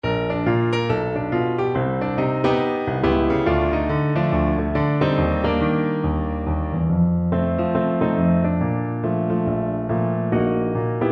jump blues joy to the world

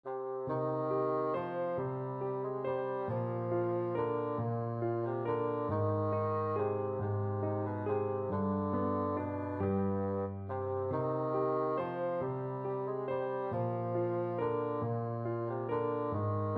greensleeves, bassoon

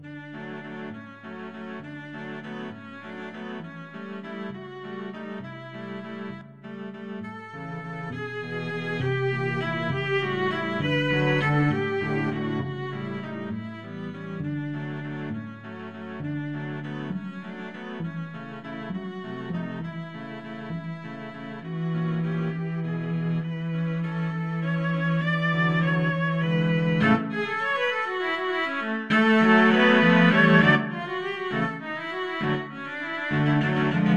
cello quartet; waltz

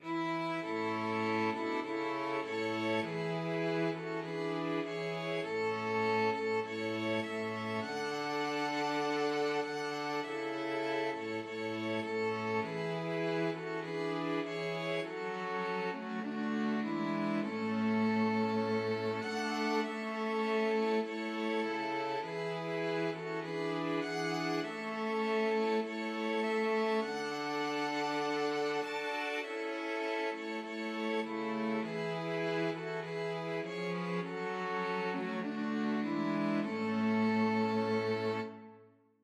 string quartet; auld lang syne

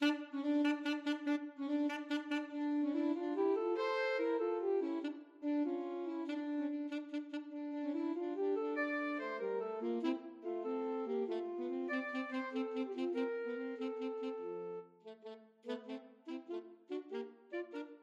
alto saxophone duet

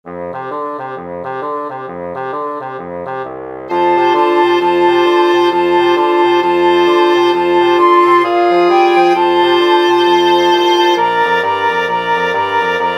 wind quartet; jingle bells boogie

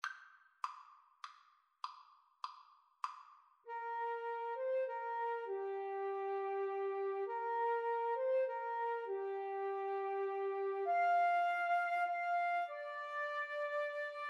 silent night, Flute Clarinet Duet

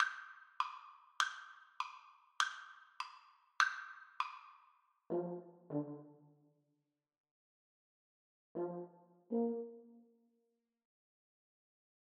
skip to my lou, French Horn Duet

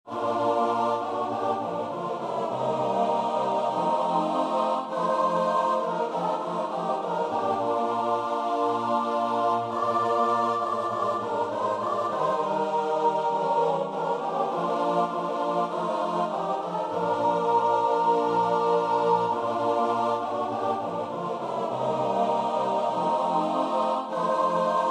just a closer walk, choir

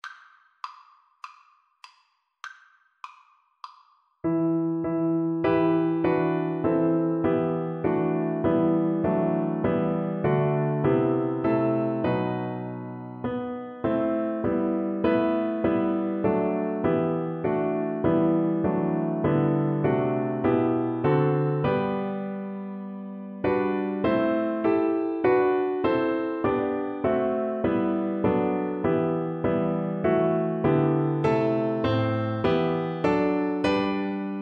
god rest, Viola

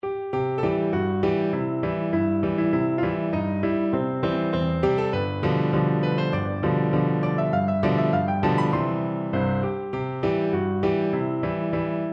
E, piano

